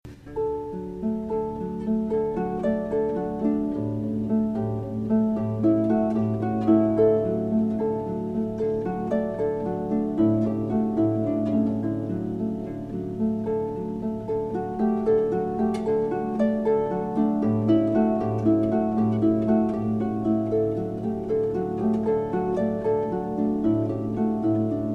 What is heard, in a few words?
English Folk Song